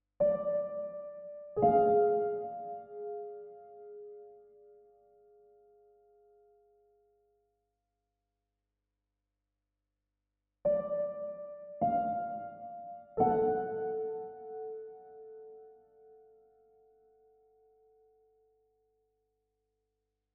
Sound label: piano; Music (used and not used in the final version) for the movie Le détour